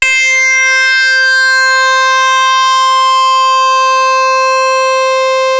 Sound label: SYNC HARD C5, LEAD; Music Store - Claudius Diemeter Schwerlos